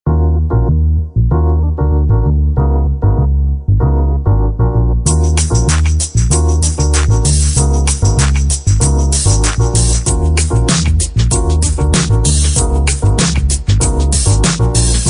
best action music

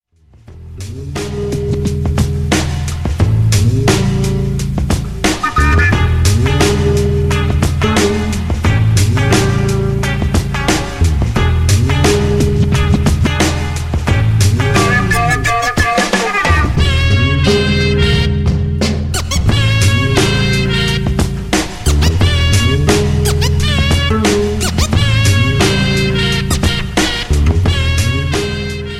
detective tone

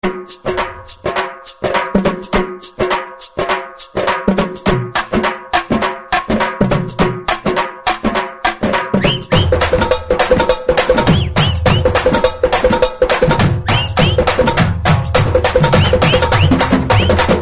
mass beats

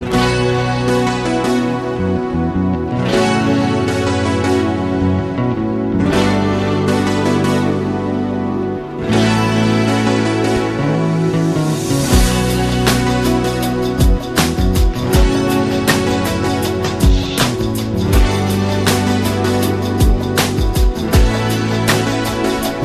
classic rock tune

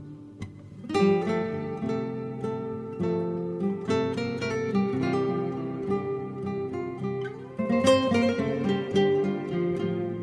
etnologic guitar

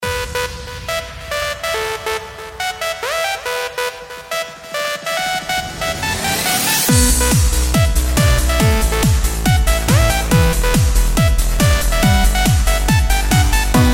croatia hard dance

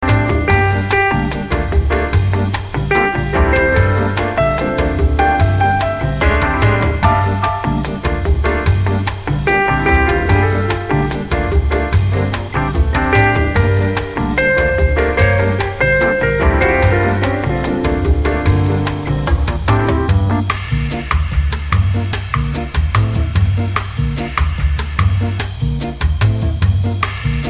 funky brazil samba

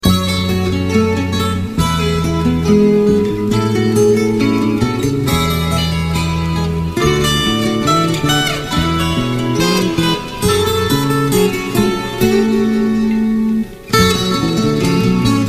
nice guitar acoustic

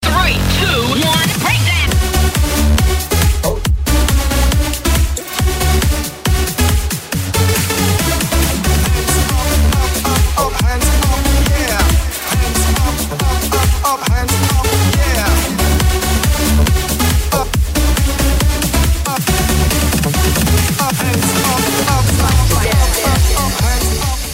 dance music

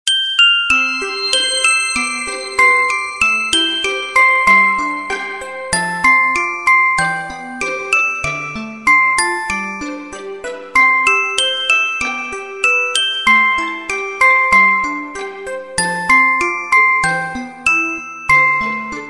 christmas melody

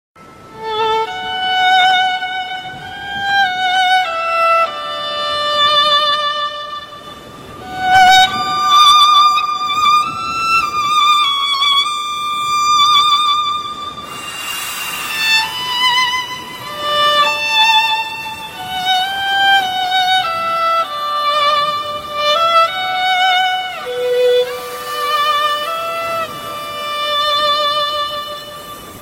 violin tune

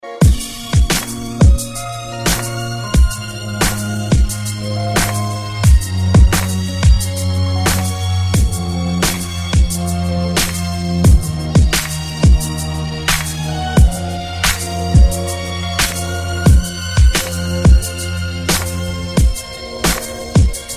hip hop beat

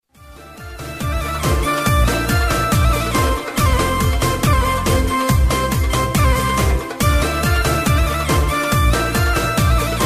classic flute

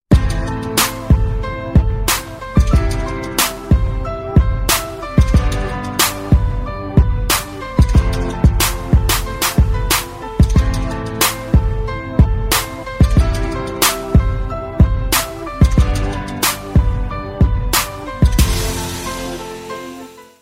nice sound

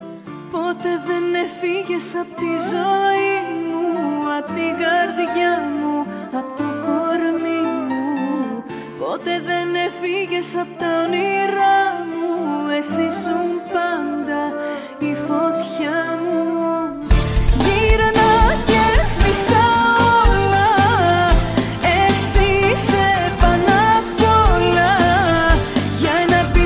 greek

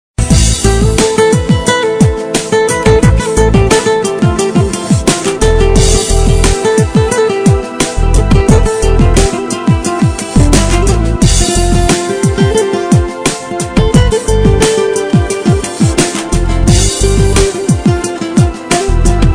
guitar

